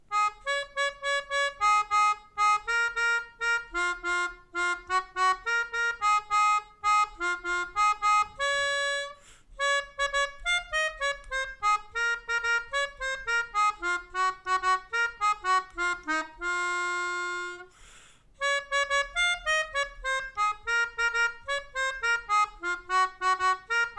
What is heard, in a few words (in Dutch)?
Ik ben vandaag zo vrolijk, Melodica